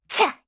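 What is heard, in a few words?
attack, Voice Line, Korean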